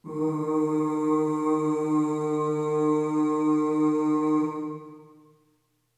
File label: Ooh Long D#2 Short Reverb; Harmonic Building Blocks